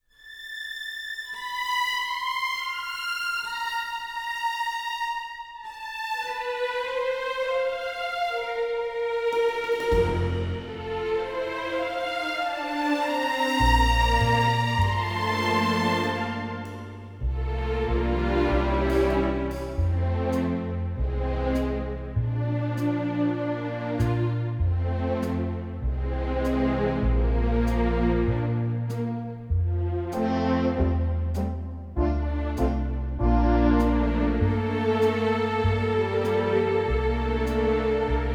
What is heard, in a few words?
F orchestral; key - F - vocal range - Bb to D; "the King and I"; gorgeous orchestral arrangement